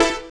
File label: DR. LOOP; X-Static Goldmine 3; CHORDS